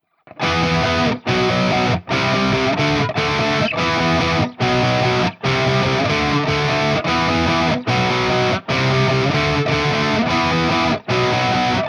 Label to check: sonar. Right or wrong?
Wrong